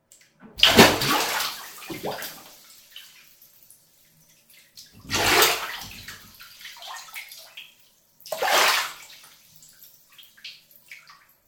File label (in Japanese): お風呂のお湯で遊ぶ 大きいバシャ音